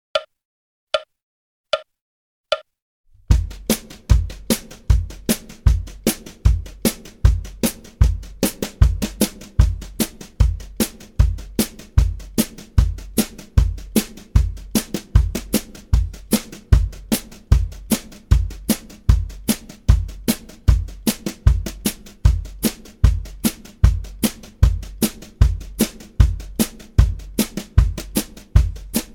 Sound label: Country Rhythm 76 bpm, Guitar; Guitar Aerobics Disc 2